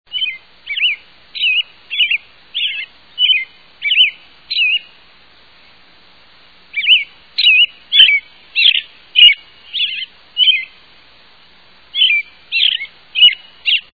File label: American Robin